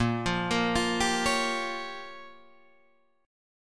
A sharp Minor 6th A sharp down Guitar DADGAD, chord